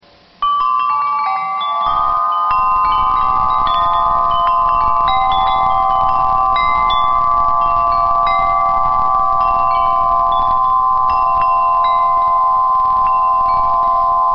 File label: Blues